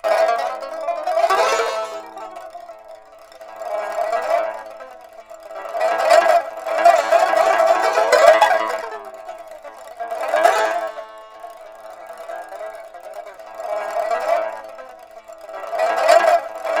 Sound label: PIPA WIND; INIS - Opium; PIPA MAGIC